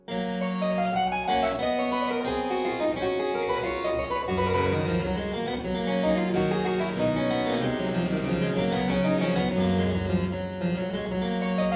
Aria in G major Partita 23, Harpsichord

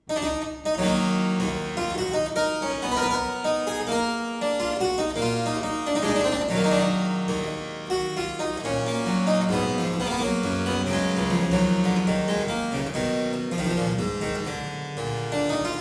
Suite in E minor Courante, Harpsichord; Buxtehude; Complete Harpsichord Music

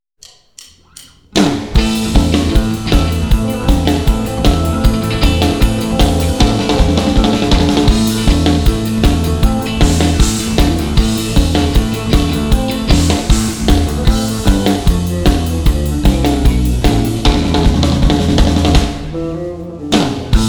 [Minus Lead Guitar]

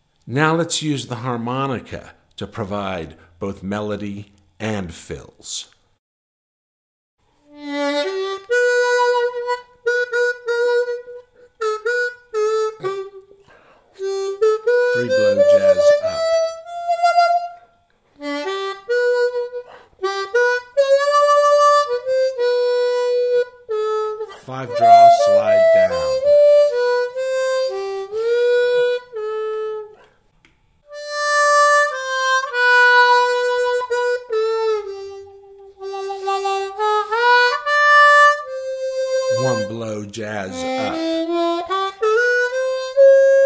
Melody And Fills, harmonica

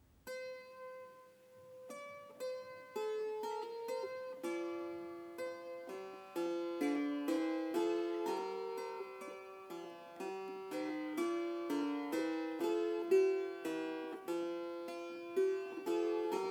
Miserere (clavichord); Byrd; BK66 [first version]

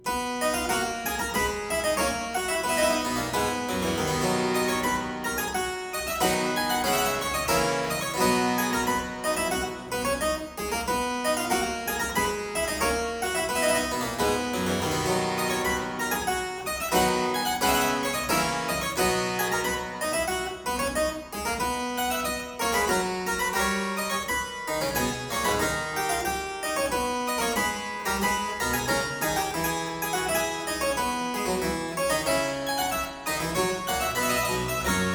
Aria in C major - Variatio, harpsichord

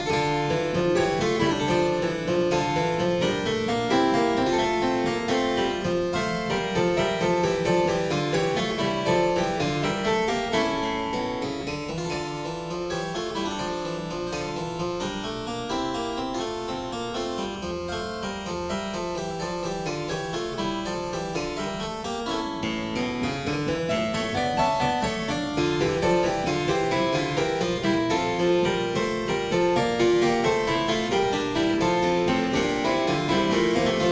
Suite in D Minor - Variation, Harpsichord; Suite No. 3; HWV 428; Handel; Suites for Harpsichord

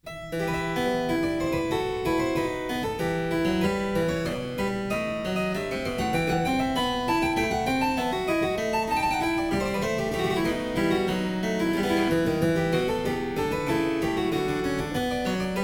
Suite in E Minor - Allemande, Harpsichord; Suite No. 4; HWV 429; Handel; Suites for Harpsichord

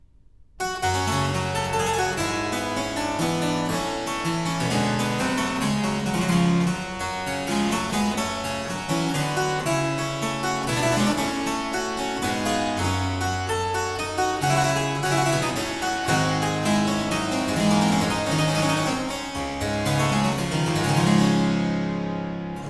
Suite in G Minor Courante, Harpsichord

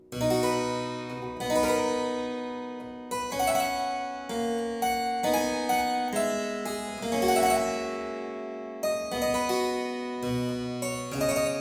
Suite in C major Sarabande, Harpsichord; Buxtehude; Complete Harpsichord Music; BuxWV228

